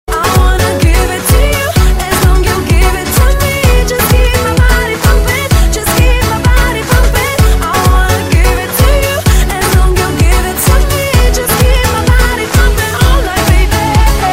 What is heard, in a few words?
pumpin, hip hop ringtone